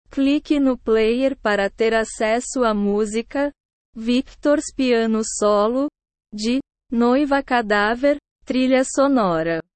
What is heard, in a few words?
piano solo; noiva cadaver trilha sonora